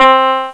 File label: simple piano tone; Echo 1.1